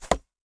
chwytanie toporkiem; apocalipsis

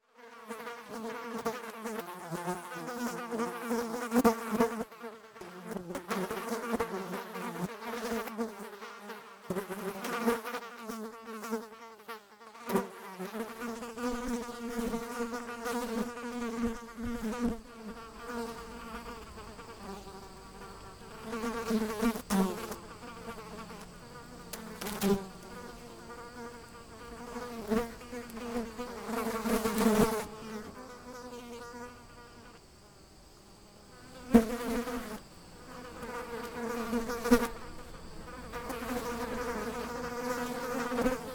latajace muchy

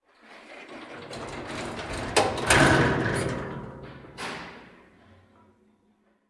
wrota podnozenie

